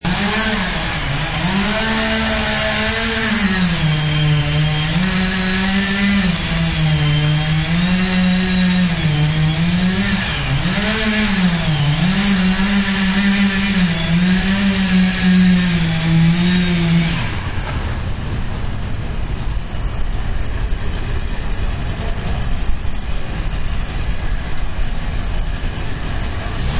Chain Saw, Chainsaw revving and cutting branches in a tree.; Power Tools